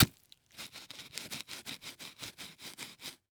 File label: Sports Stake Wood Stake Stabbing And Twisting In Dirt